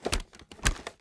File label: reload empty end, weapons; xmag; gmod; viper